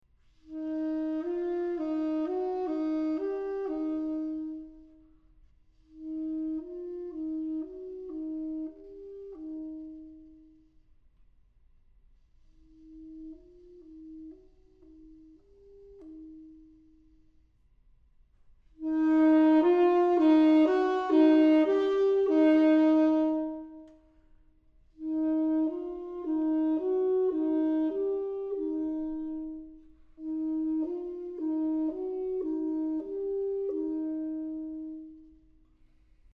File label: throat tones, saxophone; saxophone orchestration